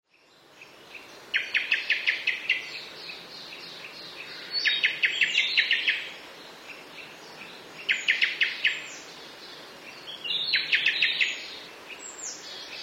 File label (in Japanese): kurotsugumi; クロツグミ